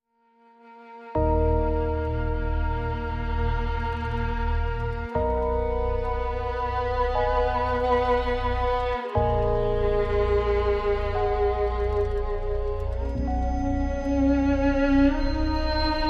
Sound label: Dark Electronic